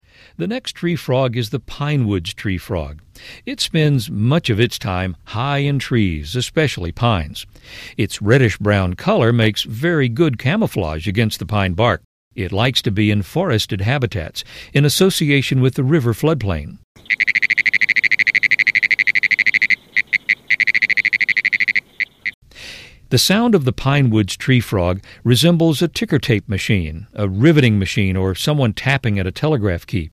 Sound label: pinewoods tree frog